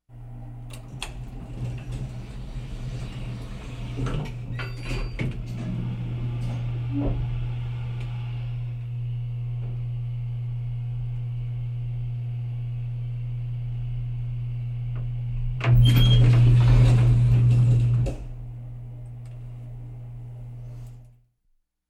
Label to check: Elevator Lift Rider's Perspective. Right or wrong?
Right